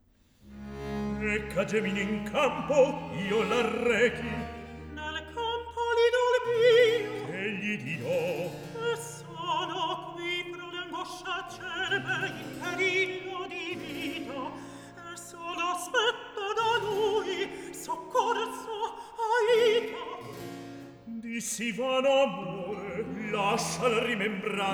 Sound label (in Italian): Recitativo; Atto 1 - Scena 7; Vitellia, Lindo; Perche a Geminio in campo io l'arrechi; Antonio Vivaldi; Tito Manlio (2005)